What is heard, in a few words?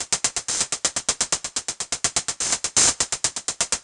drum chit hat 125, Classic Chicago House Drum Loops